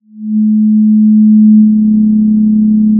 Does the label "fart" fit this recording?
No